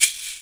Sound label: gourd; Greytsounds; EXOTIC PERC